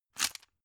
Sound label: drug blister pull out hard; berufe; arzt